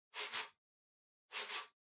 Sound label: PC Mouse Move Far Hard Pad Short